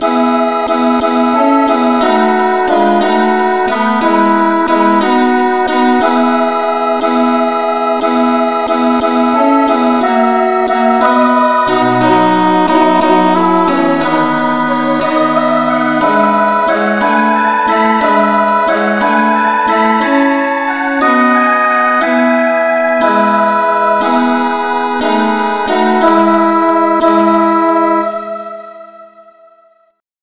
angelvoices no vocal key e

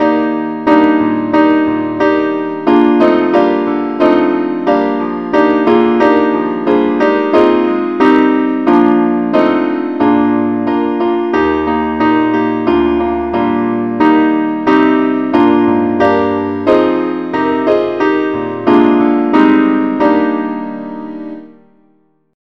keyboard key ees; saintthomas

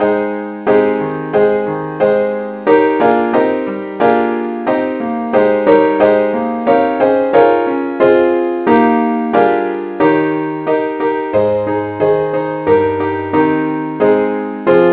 keyboard key aes; saintthomas

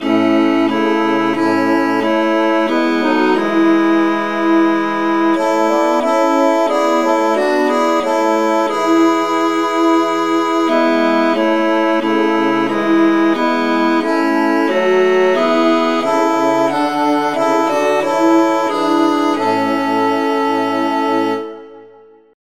traditional, key A; SAINTTHOMAS

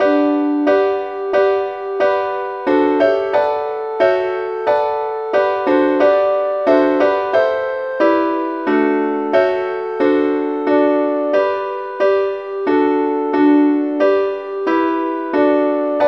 advanced lead, key G; SAINTTHOMAS

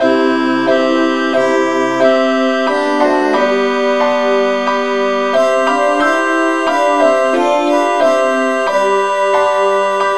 satb key c; SAINTTHOMAS

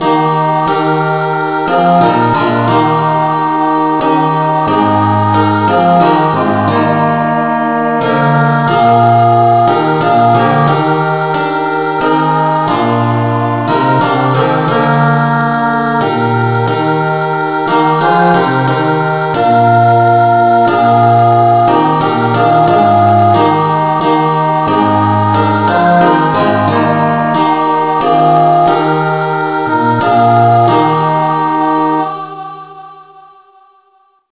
no vocal key ees

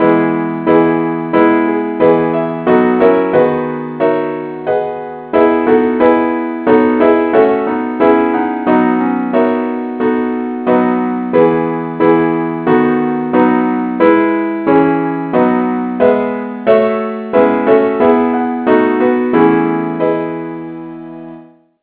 key e; saintthomas

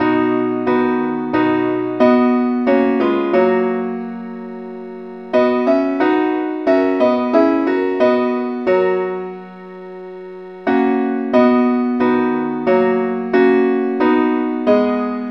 parts only, key B

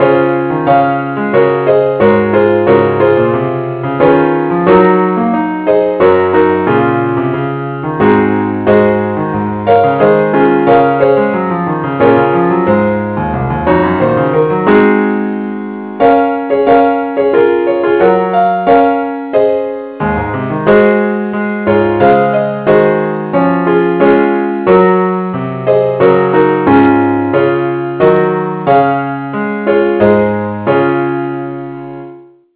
keyboard only key cis; i need thee

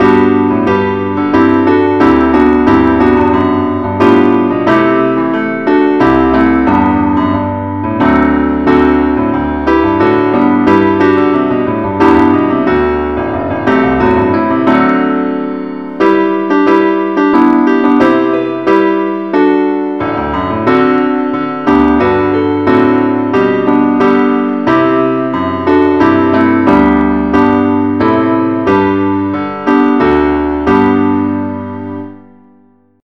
ineedthee keyboard only key g

